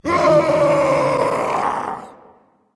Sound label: battlecry berserk; cuchulainn